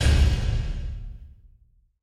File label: lock It Land; narcos